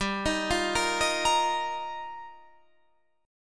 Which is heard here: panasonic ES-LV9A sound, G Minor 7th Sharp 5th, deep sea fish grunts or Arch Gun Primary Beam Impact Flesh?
G Minor 7th Sharp 5th